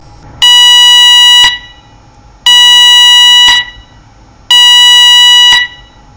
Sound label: Intermittent 970